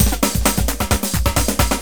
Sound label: haze, drums; Loopasonic